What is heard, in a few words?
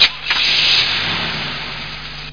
car start